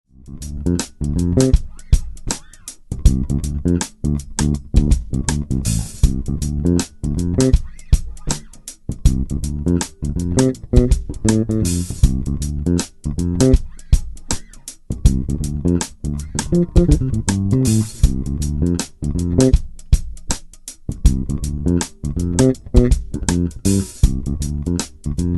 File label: bartolini jb, bass